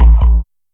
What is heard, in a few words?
JNG BASS, Yamaha DTXpress